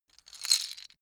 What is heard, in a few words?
lego bricks handling single